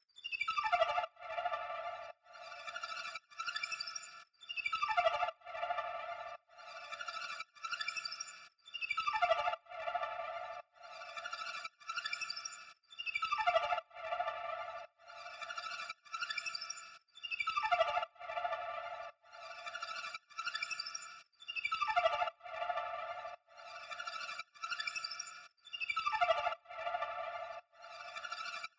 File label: 113 BPM D Min Arp; Melody Loops; Loop Stems; Cymatics; Bermuda Pt 2